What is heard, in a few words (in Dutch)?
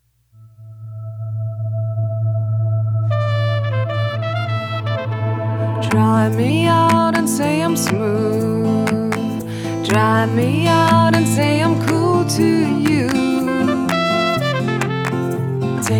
Jingel; naelco academy